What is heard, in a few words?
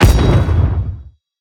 punch metal; Smite; Cabrakan